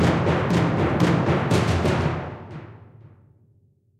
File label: Fight Start; assassin s creed chronicles india; Katasraj Dest Burnes